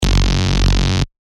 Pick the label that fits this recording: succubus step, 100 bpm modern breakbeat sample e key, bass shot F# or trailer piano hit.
bass shot F#